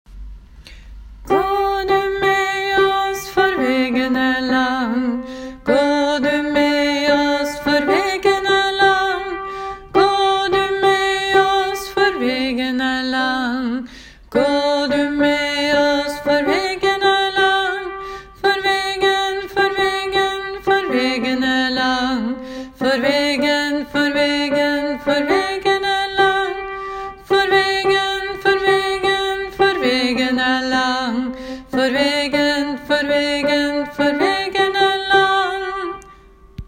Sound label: bass; hamba nahti; åfjord